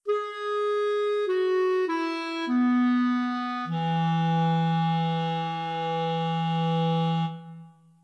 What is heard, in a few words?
game over